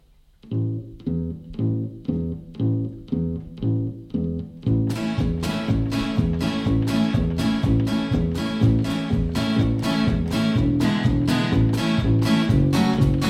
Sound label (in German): Landjugendlied Instrumental